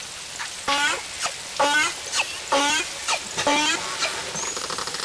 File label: Bray